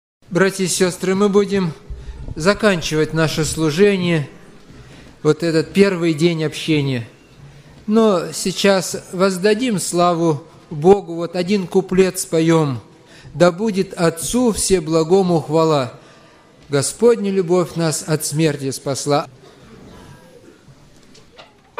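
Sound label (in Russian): Общее пение; Юбилей; 45 - Летие братства.г.Новомосковск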